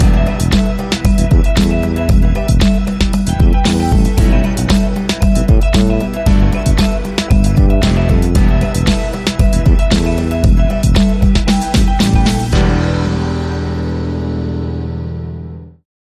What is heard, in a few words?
Funky Catwalk Show